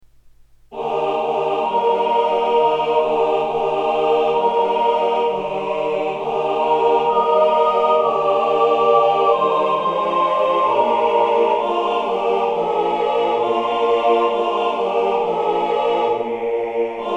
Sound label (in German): wiegenlied tutti; Chants; Wiegnlied (Brahms)